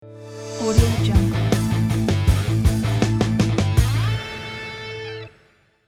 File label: sport logo